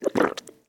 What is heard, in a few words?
booster activate